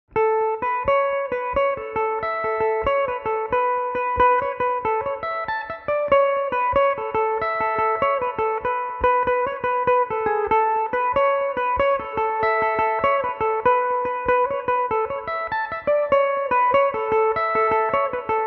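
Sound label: A major, irish mandolin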